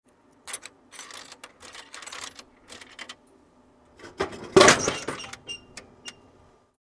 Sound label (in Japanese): 自販機でジュースを買う音; 日常生活の効果音